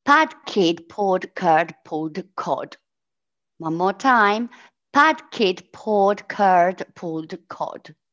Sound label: Pad kid